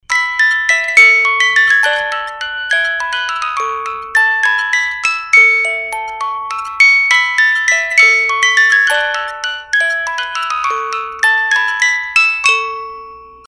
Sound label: musicbox